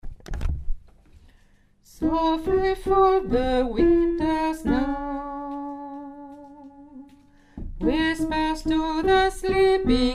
glow alto

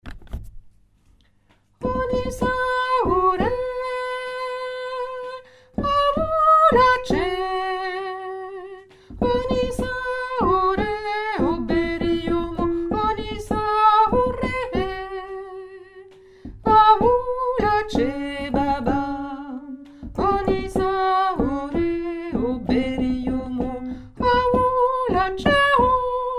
canto de condomble soprano tenor

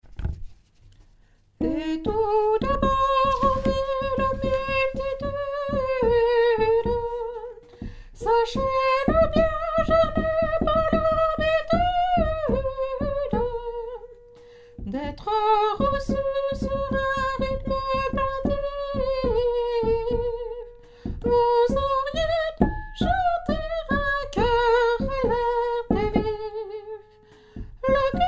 solo tenor, chorale; Paris

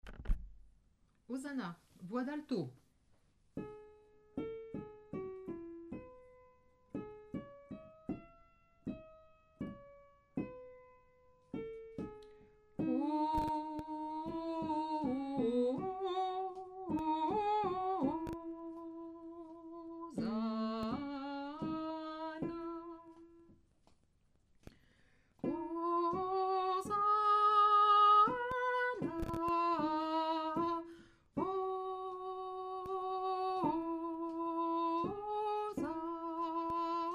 hosanna alto; repetition agen septembre 2024

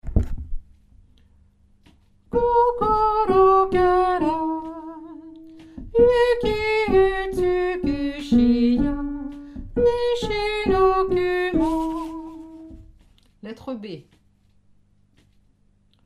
mezzo; chorale

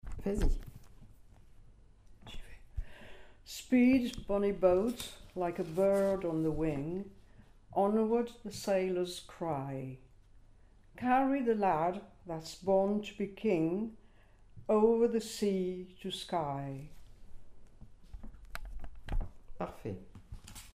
chorus, chorale; skye boat

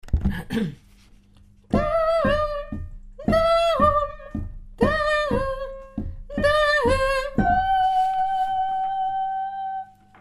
tedeum tenor; chorale

